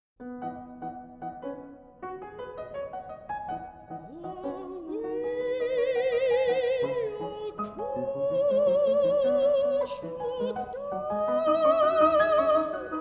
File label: piano; dust of snow